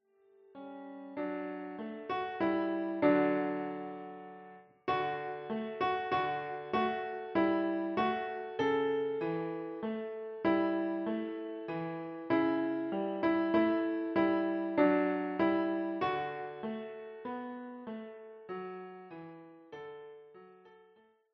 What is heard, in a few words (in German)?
Suesser die Glocken nie klingen, Klavier